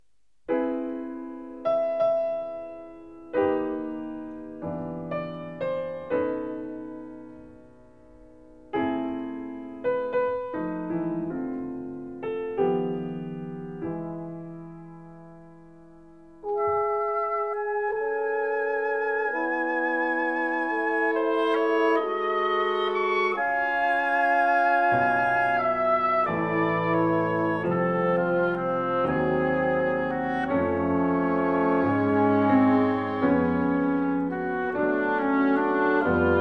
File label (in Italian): Sarabanda; V.Rieti; Ensemble galzio di Roma